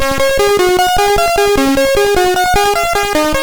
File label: Guitar, MOS6581R2